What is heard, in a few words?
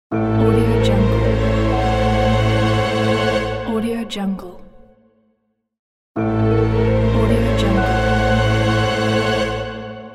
murder mystery